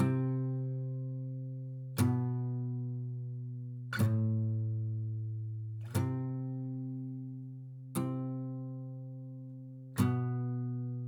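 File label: acoustic pattern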